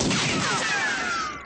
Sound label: gun sound with riccocet; sfx